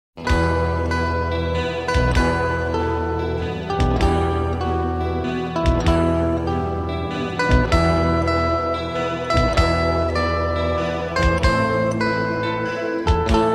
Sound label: zither romantic